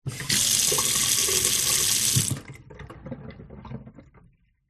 household sink metal no plug tap on run off

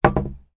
industrial shovel wooden handle lean up on wall; zapsplat

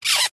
car foot pedal wet shoe squeak short; vehicles

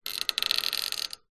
metal bendy pole light stretch bend creak; foley

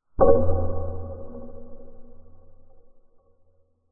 sound design metal clunk reverb dark; zapsplat

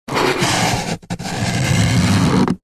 foley cardboard box flap move creak

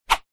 cartoon whoosh fast pass

